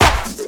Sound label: COMBI, Houseworx; Ueberschall; Workz-9